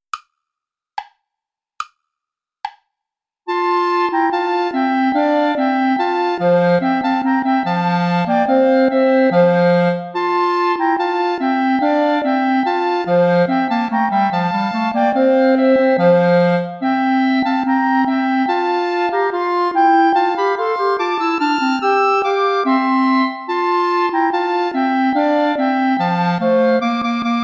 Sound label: play along without clarinet; schma ckt den saal mit gra nen zweigen